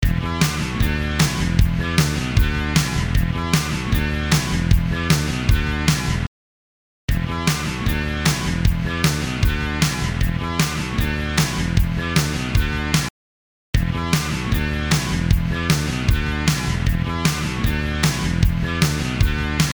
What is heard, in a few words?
Parallel Neve Hi Gain Comp Parallel Drum Slam